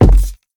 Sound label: Footsteps Wood Run; The Town